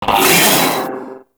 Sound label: Vocal Pain; Warframe; The New War; Archon; Nira